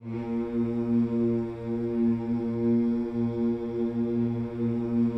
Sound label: HMMS A#2, Gospel vocal; Spectrasonics Vocal Planet